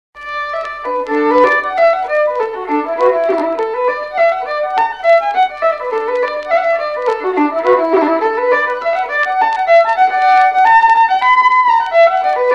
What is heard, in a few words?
Sliabh Luachra reels; the purring girls of the village denis